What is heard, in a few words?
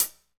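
HH, hi-hat; Sound & Vision - Gigapack I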